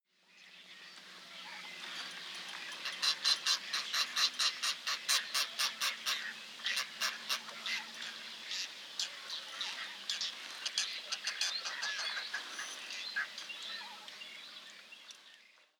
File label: Pygmy Cormorant